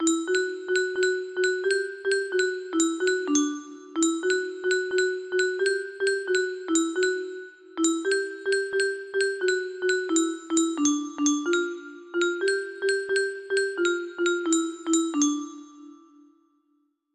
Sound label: melody, music box